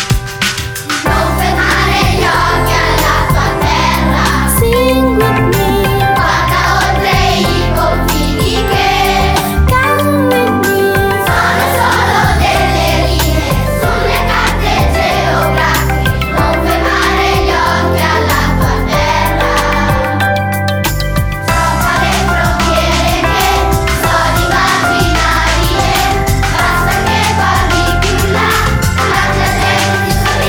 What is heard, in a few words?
singing